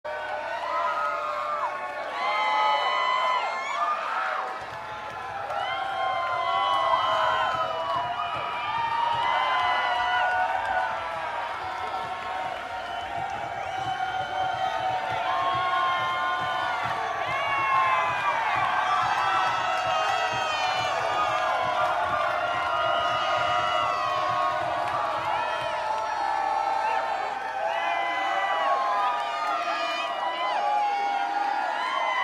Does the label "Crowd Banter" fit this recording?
Yes